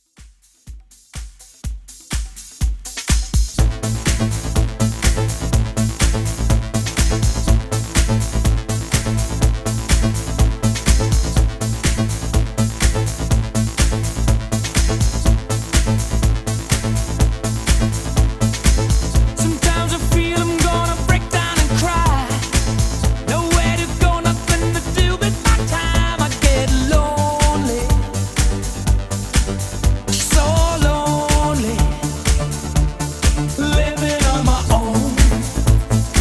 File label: Dj Intro Outro 124Bpm